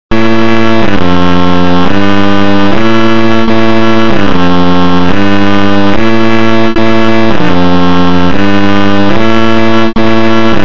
8bit chore

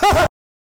weird haha

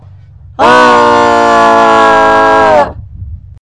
alien scream

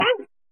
pain; roblox

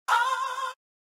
awww rap chorus

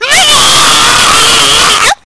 screamer kitty

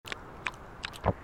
seal eating

